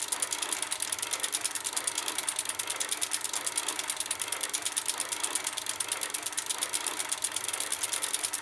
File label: metal rattle; tanks; War Thunder